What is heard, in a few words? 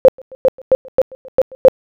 amplitude song; adlib